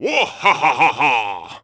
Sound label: Wuhahahaha; Salvatore as Admiral Dolvalski; Zelda